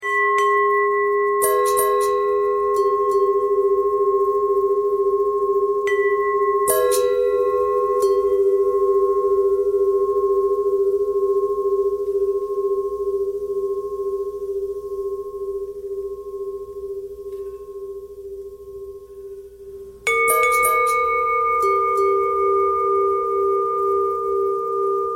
long bell bell bend threads

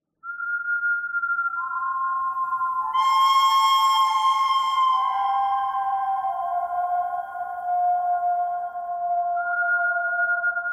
bird cry bird theme pentatonic

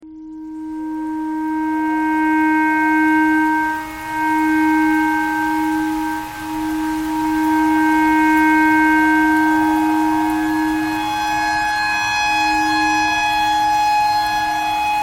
tornado siren clarinet thread pentatonic

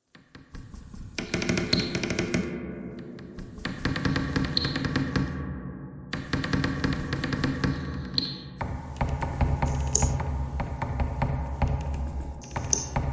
bagpipe thread noise; reverb

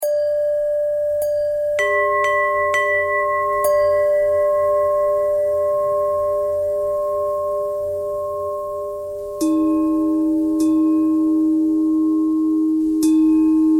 bell bell thread noise

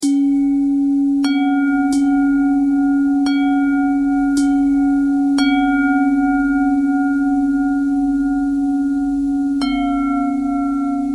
bell bend threads

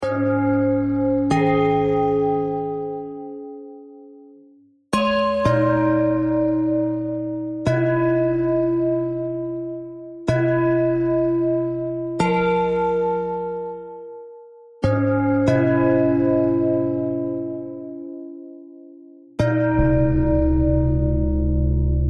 bell threads

